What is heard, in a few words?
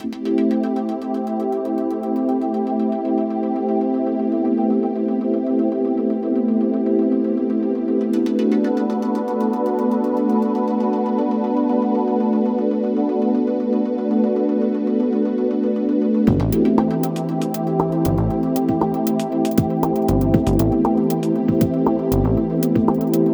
airy loop